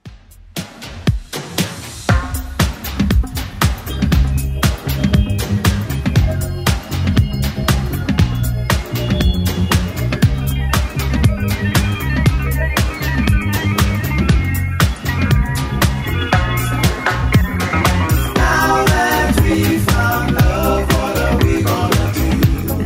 70s Redrum 118bpm

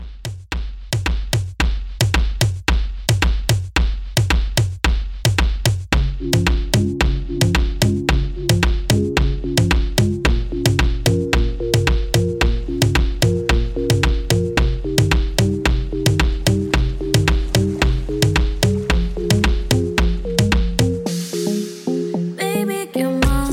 Latin Reggaeton, 111Bpm

